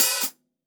OPEN HH, HI HATS; Basement drums vol 2

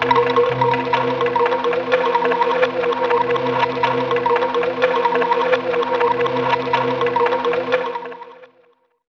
Anklungs, Roland BK-5

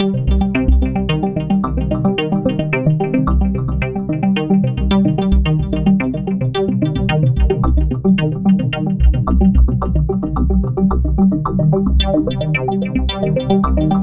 Synth Arpeggio, Synths; Eclectic Electronica

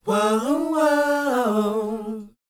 WHOA A, Vocal, R&B & Dance; ILIO; Vocal Planet

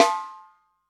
SN, Drum Machines

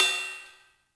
Cymbals, Elektron MachineDrum SPS1 MKII

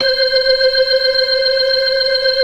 FAST VIB, ORGAN, TREMOLO VIB; Keith Emerson Signature Series

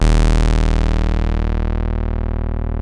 TEISCO, BASSES

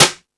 Jazz Drums, E-MU Pro-Cussion

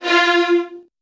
F1, VN 16TH, Virtuoso Strings; ILIO; Kirk Hunter

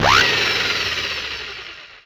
Synth Perc; Dream Zone

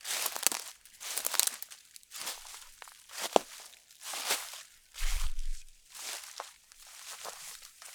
Steps Loop, outdoor, Footsteps

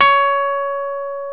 PNO, Rhodes Mk.V; Keyboards of The 60's and 70's

